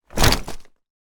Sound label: clothes drop, leather